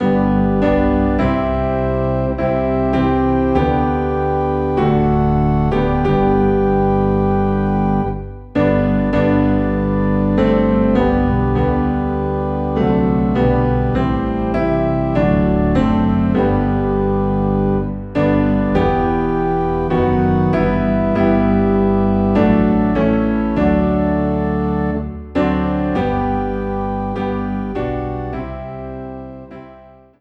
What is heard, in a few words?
Piano and Organ Low in G